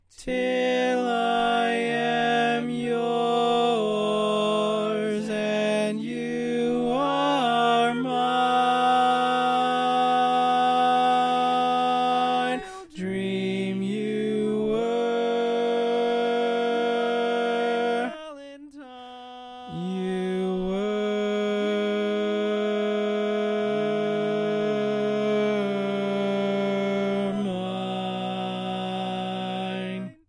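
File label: Baritone, barbershop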